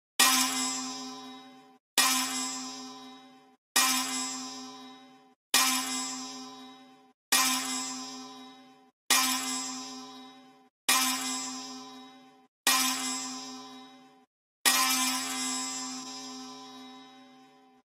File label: Pendulum Clock